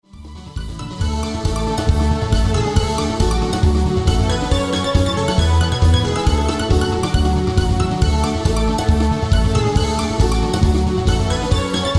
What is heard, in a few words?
acoustic